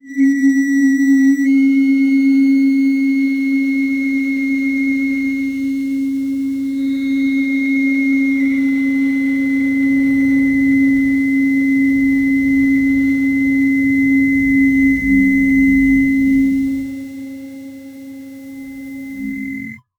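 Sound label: ethereal resonance vibration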